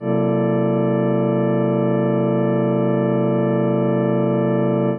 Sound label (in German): prometheus e1 hoch